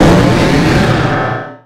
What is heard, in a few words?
pokemon cry